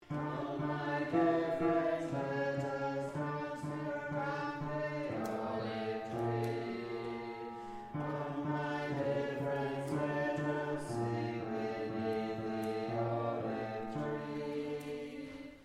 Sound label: Bass Chor Slo